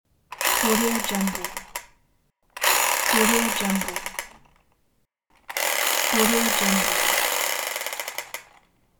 toy gun

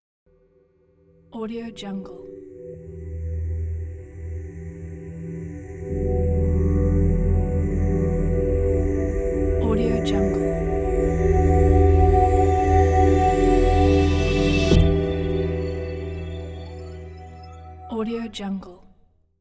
smooth logo reveal

